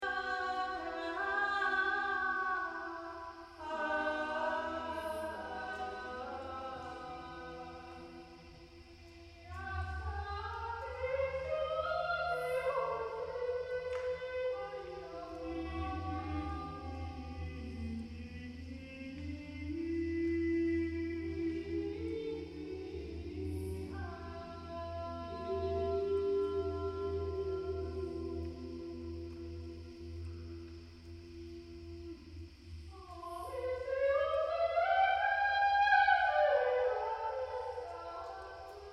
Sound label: Improv Renaissance